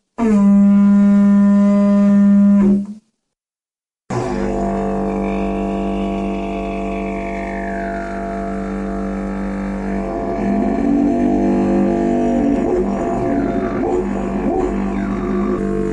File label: Agave Didgeridoo Handmade Tuning G 49 Hz; Ballato